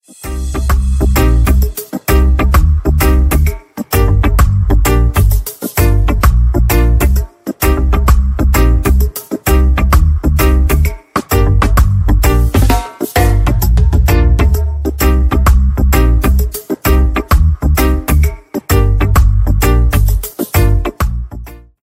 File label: reggae ringtone